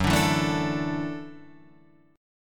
F sharp Minor 7th Sharp 5th F sharp, Guitar Standard; strummed; JGuitar